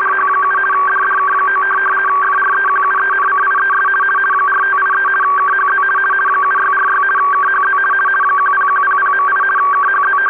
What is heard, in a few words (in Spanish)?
sonido ejemplo teletipo